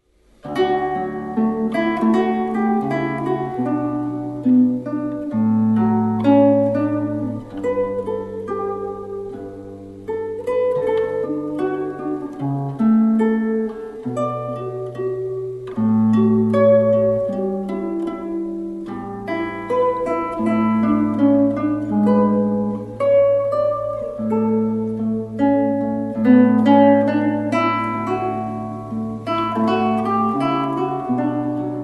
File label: guitar